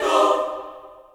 choir

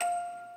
musicbox

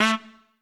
Trumpet melo

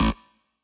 bass

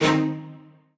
strings

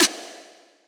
vox

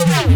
bass

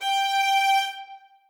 string

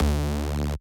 bass

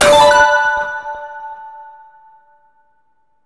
TRACK START BUTTON; maimai

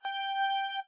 lead

